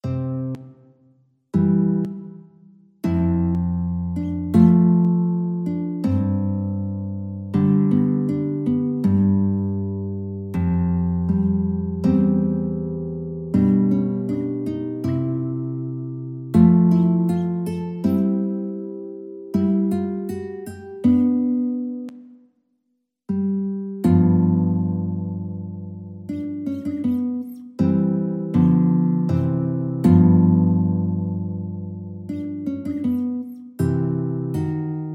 romance, guitar

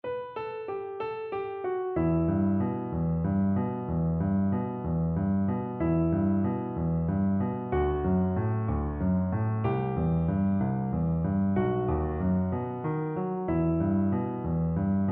spanish violin

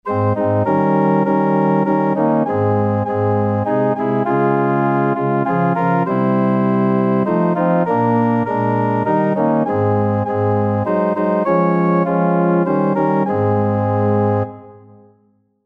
organ

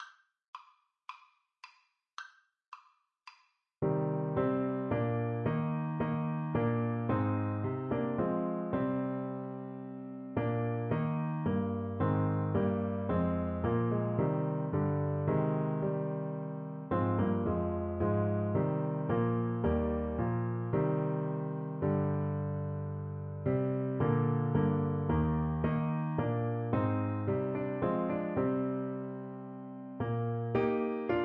Saxophone

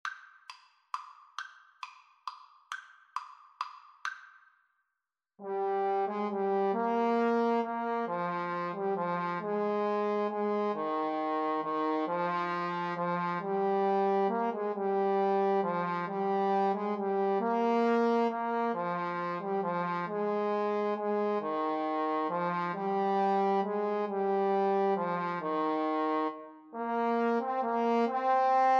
sonata 331, Trombone Duet

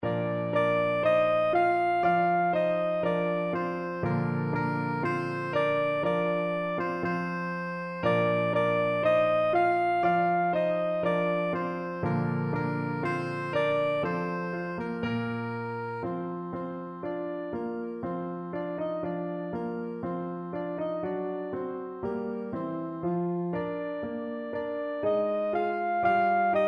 ode to joy soprano saxophone

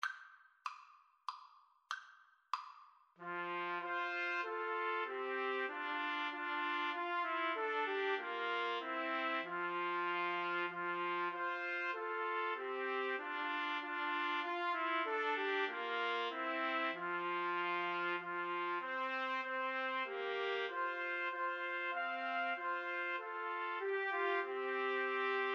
TPT trio, Trumpet Trio

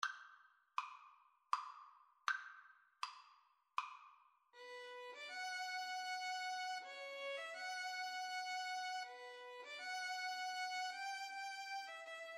waltz violin cello; schubert; op 18 no 6

